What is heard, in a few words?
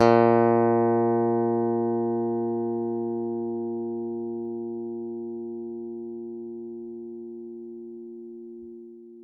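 Yamaha pick A#2, Bass; Masterbits CLIMAX 7; PATITUCCI BS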